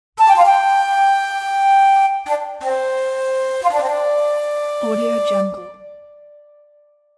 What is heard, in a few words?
western flute